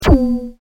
zwing

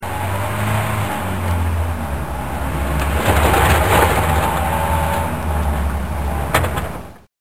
trucks

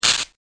CRUNCH

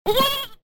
lamb

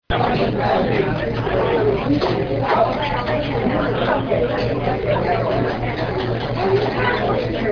Crowd Talking

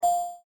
beep

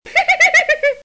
Laugh Woman

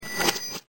cash reg

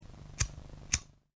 zippo

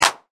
Claps

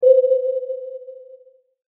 sonar

